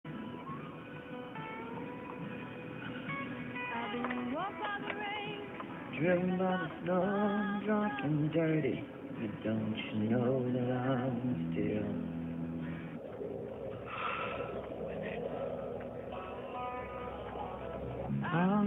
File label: Country Music; The Abyss(Sound Track)